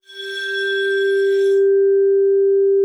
ARCO G4, VIBES; Xsample VOL-4

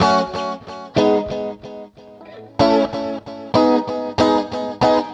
Electric Guitar

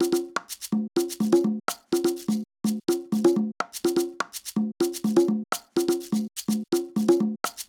top perc, 125 Beats

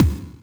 kik drum w air, Jazz Drum Kit; Giga Samples Collection

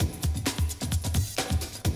130, Classic DrumLoops

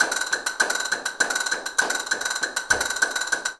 Castanets, Flamenco

